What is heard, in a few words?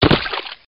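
land flesh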